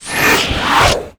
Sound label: air blast